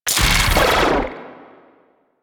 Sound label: Ball Time Bomb Explosion; Warframe; Missions; Tenno Ball